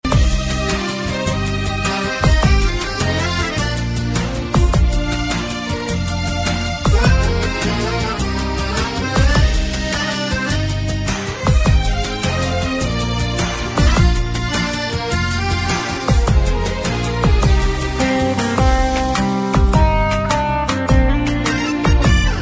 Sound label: Melody Ring